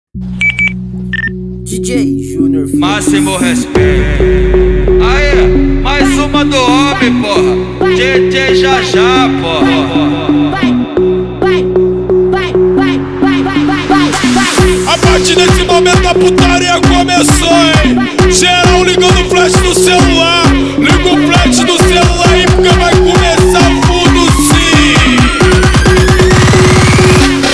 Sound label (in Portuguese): funk rave; projeto feste funkrave